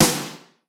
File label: Snare, Yamaha RY20